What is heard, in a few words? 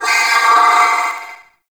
Attack Long, Vocals; Warframe; Sentients; Sentient Trooper